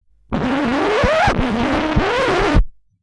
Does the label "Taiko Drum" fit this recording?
No